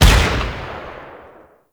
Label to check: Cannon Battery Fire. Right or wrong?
Right